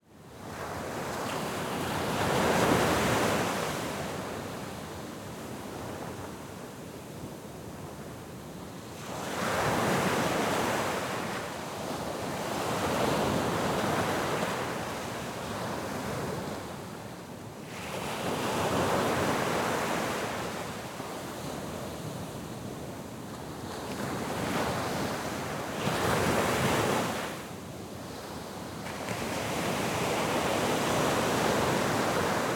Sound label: WAVES - Beach Medium Waves Near, Caribbean Coast; Nikkö Barrera-Amaya; Colombia